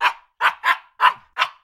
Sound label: dog voice; anemoi